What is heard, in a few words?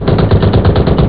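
machine gun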